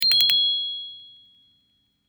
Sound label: correct; ゆっくりのピンポンを太くしたverの超低音ver; 正解の音・ピンポーン